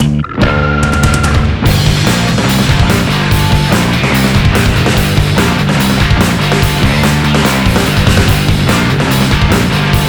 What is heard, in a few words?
Battle Bonus BGM; pachislot ashitano joe